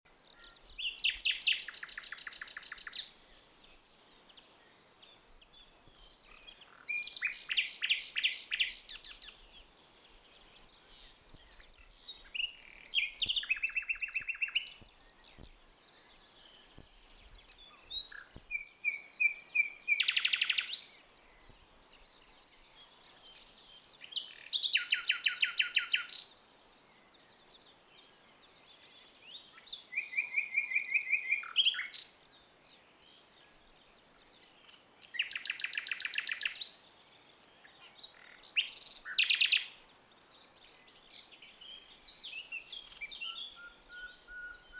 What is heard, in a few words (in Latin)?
Luscinia megarhynchos; SETE